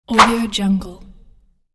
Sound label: fast woosh; wistansound